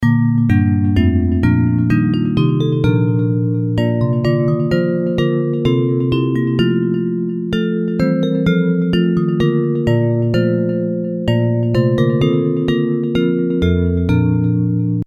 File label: Bells